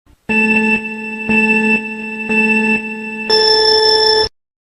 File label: racestart, zvuki starta gonki